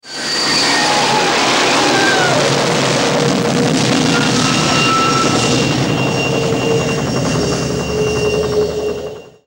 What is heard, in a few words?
Howl; Airwolf